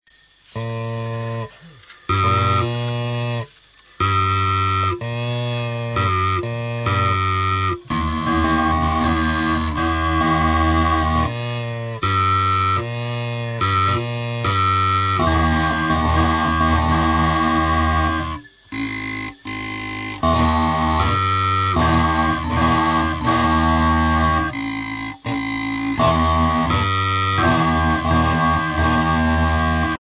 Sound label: Wayapi Clarinetes; Brasil; French Guiana; Le Toucan